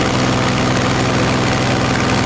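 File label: Plane P40 Interior Inflight Loop; Airplanes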